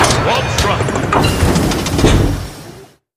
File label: attack all over